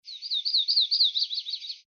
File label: Yellow Rumped Warbler